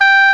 Oboe (G4); X-Wing Demo; X-Wing Patches

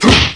Grunt hi; Atari HQ Archive #1; Demo Man

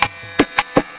new game; Hyper Stacks; Pacific HiTech; Chemistry; Thermo

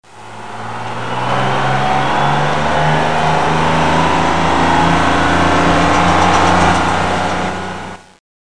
tv truck; Busy people of hamsterland

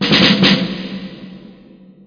snare riff; 11,025 sample rate; 2 seconds; PFFT!; SpecOps2MPlayerDemo; Music

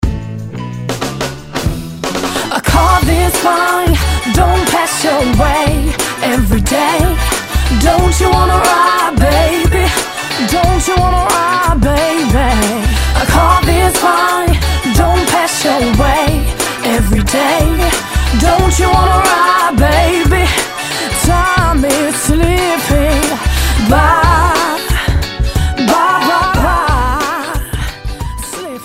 RIDE; The Sunday Times - The Month

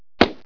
shoe kick; Screen Wreckers

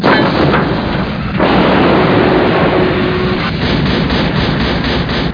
STG 1 SEP

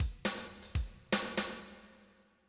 jazz; drum; Sounds Terrific II; Weird Science

